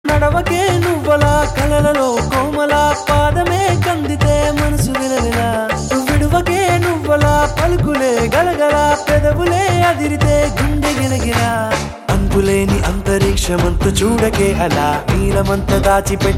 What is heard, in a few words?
Love Ringtone